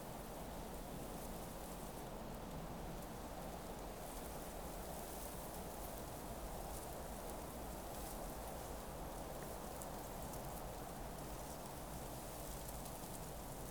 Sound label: outdoor sky breeze; anemoi